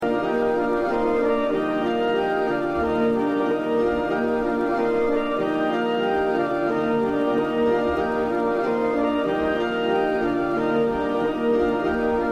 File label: flutes air